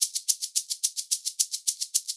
110 SHAKERS, Percussion Loops; USB Soundscan vol.36; AKAI

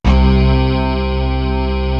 DISTRT, ORGANS; East West; Global Sounds

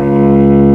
CELLO, MELLOTRON; East West - Global Sounds

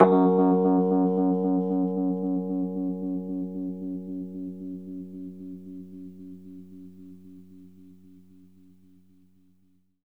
RHODES F#, L; 3D Sound; 3D Keys VOL-1

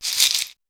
CHEKERE; East West; Global Sounds

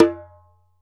ASHIKO, African Drum

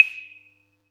Yamaha EX-5

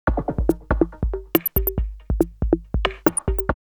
Loop Dis 3 140, drumloops Rave; X-Static Goldmine; 90 s Sample CDs

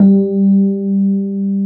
AMB ROAD

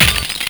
Glass Break (13484Hz); Sonic 3; M8; Genesis

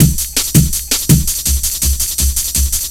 165 hard n soft, Drum Loops, Drum & Bass; Total Drum&Bass

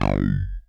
I BASS; Greytsounds; Scott Peers Sound Engineering

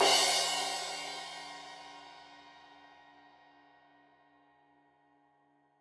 Crash 15in L edge, Cymbals Stereo

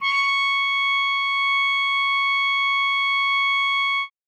trumpet C#6, Trumpet harmon mute

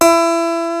CLAVECIN, HARPSICH

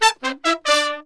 MERENGUE, World Grooves

